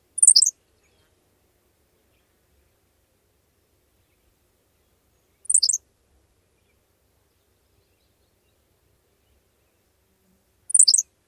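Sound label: Henslow's Sparrow songs; NY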